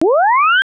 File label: skill cast; heros